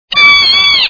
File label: fox ahh